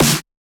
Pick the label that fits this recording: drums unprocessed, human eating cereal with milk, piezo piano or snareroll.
snareroll